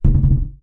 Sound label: balloon inflated hit surface bounce fast; foley; zapsplat